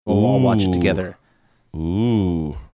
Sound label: ooh ooh